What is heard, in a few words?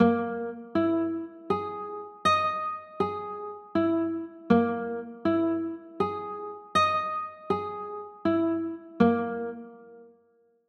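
G flat 6 chord, guitar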